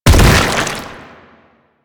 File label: Bodyfall Light; Warframe; Proto Infested